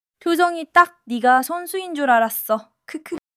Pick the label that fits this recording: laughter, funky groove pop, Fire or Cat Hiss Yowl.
laughter